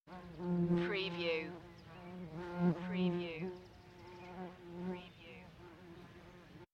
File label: Fly buzzing by; beanstalk audio